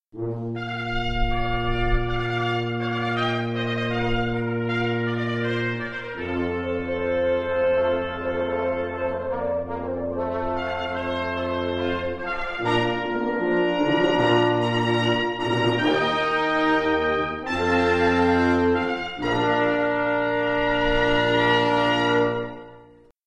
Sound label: birthday fanfare live